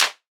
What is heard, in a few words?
funky disco clap